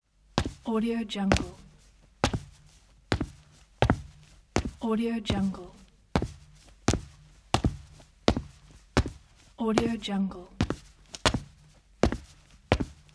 slow male steps

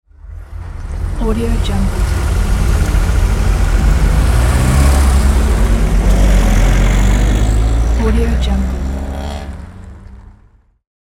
minibus starts running

